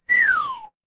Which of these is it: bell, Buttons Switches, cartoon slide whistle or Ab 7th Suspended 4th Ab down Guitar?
cartoon slide whistle